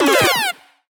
Retro FX; Cymatics; Video Game Sample Pack Vol 1

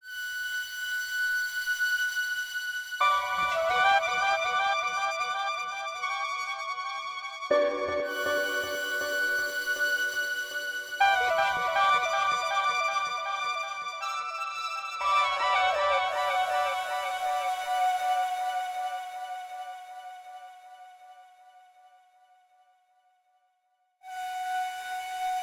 120 BPM B Min Flute, Trap; Melodies; Loop Stems; Bazaar

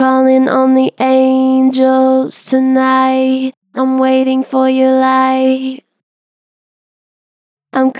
Gen Flat Callin on the angels tonight, Vocals; Harmor Vocals

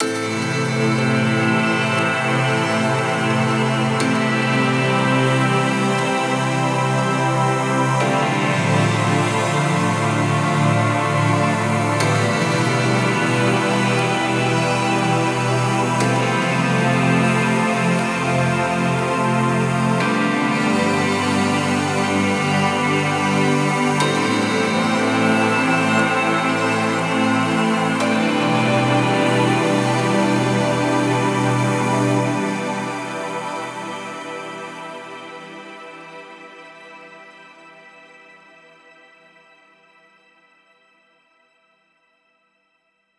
Synth Pad - Am 60BPM; STEMS; Ghosthack; SkyRealm; Mood Kits; Mood Kit 08 - Shining Light

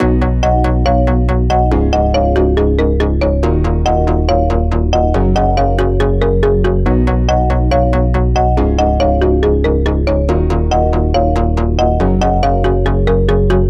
Dubstep Chord Loop 150 BPM D# Min, Synths; Loops; Cymatics; EDM Starter Pack

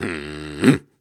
Snarls and Hums, Viking Vocal

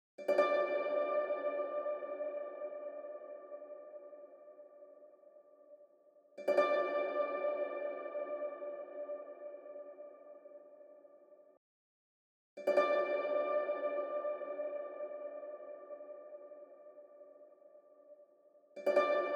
155 BPM D Min Harp; Reverse; Loop Stems; Cymatics; Throttle; 2022 Melody Collection

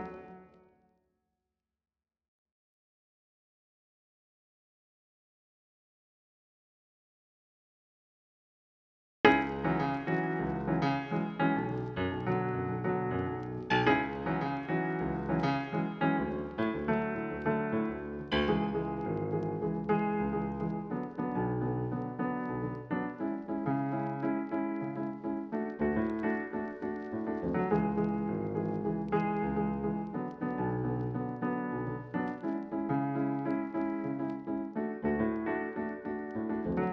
keys, 104 BPM A Maj; Istanbul

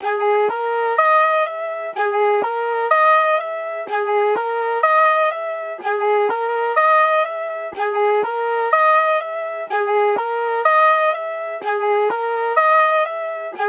Flute, 124 BPM G# Min; Melody; Loop Stems; Cymatics; Cymatics - 2022 Melody USB Expansion; Vandal

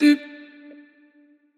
Wet One Shot - D, Tonal Vocal; Cymatics; Euphoria Vocal Sample Pack

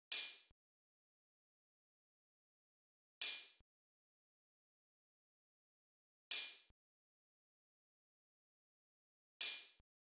Drum Loop 155 BPM Open Hat, Trap; Loop Stems; Ambush

